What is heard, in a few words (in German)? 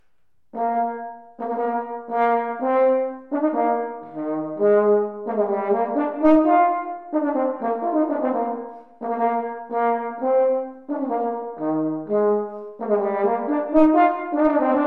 Horn Marsch; Hogwarts